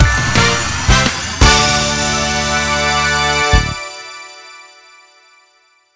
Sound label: Big Win End; netent